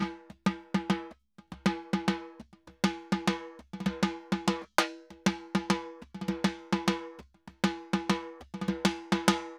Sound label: Timba Bomba 100, Drums & Percussion, 100 bpm; Best Service; Festa Latina VOL-1